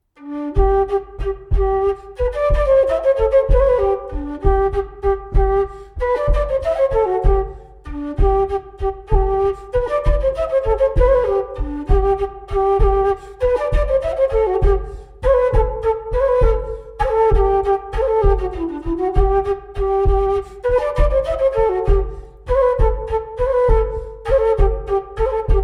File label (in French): Montagnarde; Recueil De Raoulx